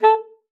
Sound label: A4, alto sax; Xsample VOL-2